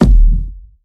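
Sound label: bass drum; mehackit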